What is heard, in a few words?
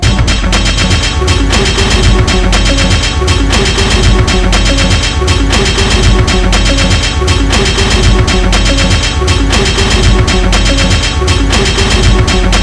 Industrial Madness Loop